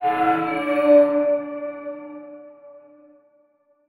Transition Musical Tone Drone; Production Element

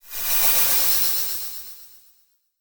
Transition Whoosh Warble; Production Element

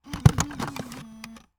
Electronic Laptop Eject CD; AdobeCC

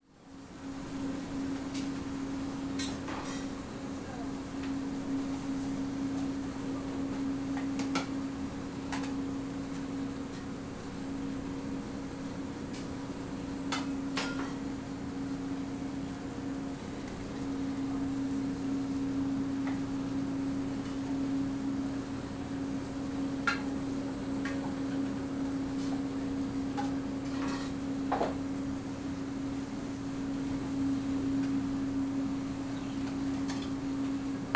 Ambience Restaurant Kitchen; AdobeCC

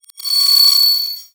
Multimedia Internet CD-Rom Flash Wipe Electronic

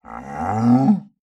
Animal Mammal Cow Moo; animals; AdobeCC

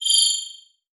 Multimedia Internet CD-Rom Flash Hi-Tech

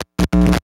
Electronic Electric Arch Single

